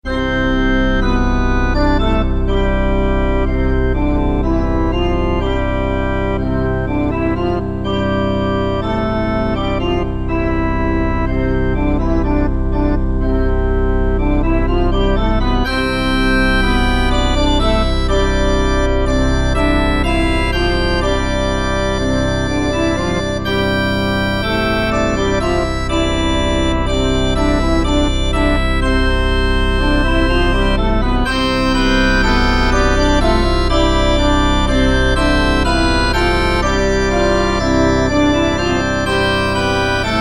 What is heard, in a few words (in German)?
Orgel theater; Manfred Upnmoor; Mr Pilks; stiller wahnsinn; luebeck